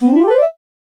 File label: music flute welcome